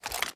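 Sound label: assault rifle reload empty fast start; weapons; gmod; viper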